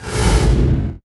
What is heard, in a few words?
IMP FLAME, Cinematic Impact